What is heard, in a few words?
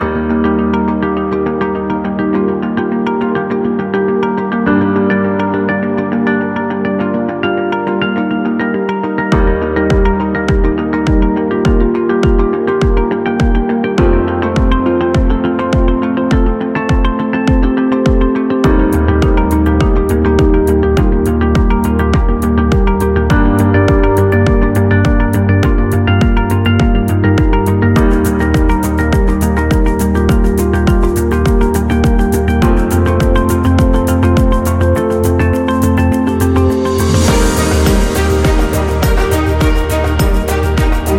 Corporate Strings; corporate music vol 19